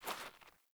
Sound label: Boot Walk Gravel, Footsteps; Characters; Enemy; Melee Grunt; Boots; Sinecure